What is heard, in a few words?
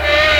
bell; E-MU Formula 4000 Series Vol. 1; Hip Hop Nation; Bonus Perk